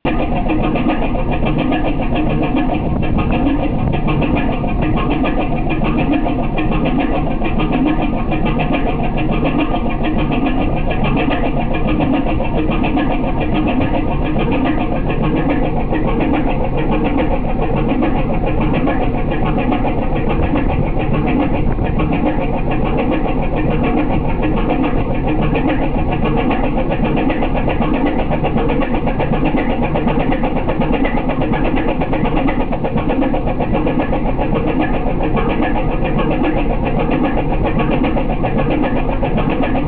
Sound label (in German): Sound Schornstein